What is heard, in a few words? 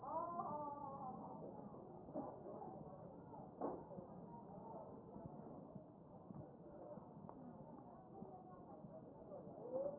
chest